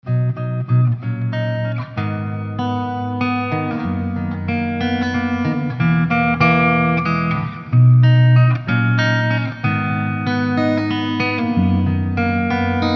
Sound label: Bogner Uberschall Clean